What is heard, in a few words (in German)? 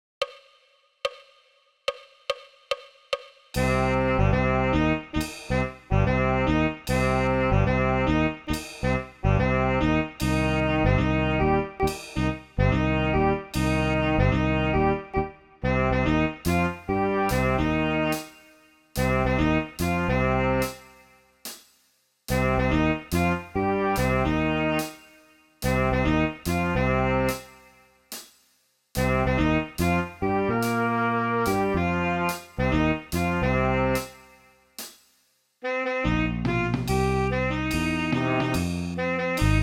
Playalong, alle Instrumente